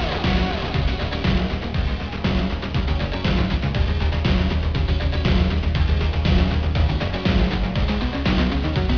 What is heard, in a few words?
baddrums